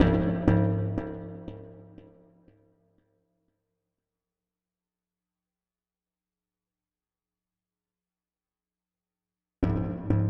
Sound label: Planet Tracks, Minimal Planet Drums; Ambient Cinematic